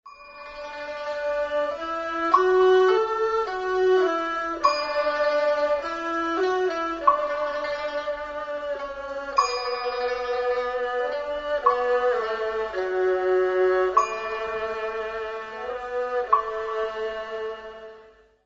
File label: strings